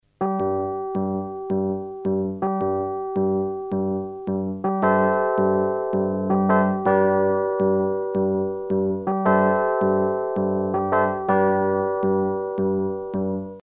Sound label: rhodes